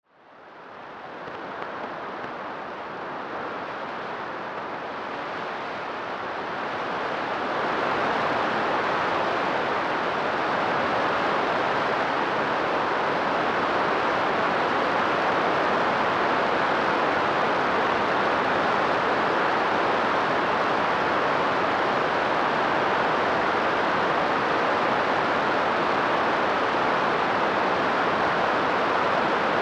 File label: shockfront